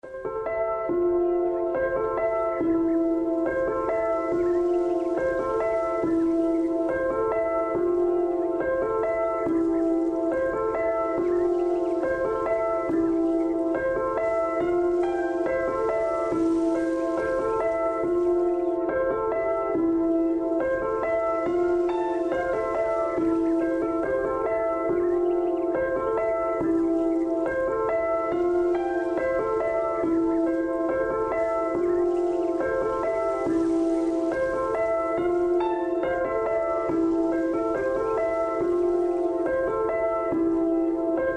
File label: piano